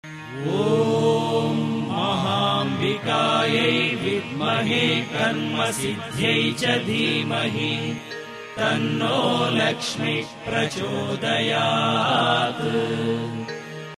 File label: lakshmi gayatri male voice